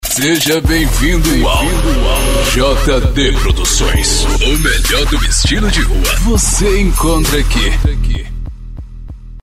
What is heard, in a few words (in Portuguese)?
vinheta palco